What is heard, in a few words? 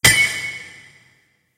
scatter Hit; rome